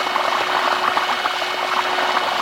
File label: Torpedo Loop; Lagoon Zombie